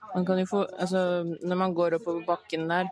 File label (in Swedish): Skrik